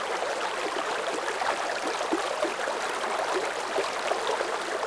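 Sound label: water flow loop; water splashes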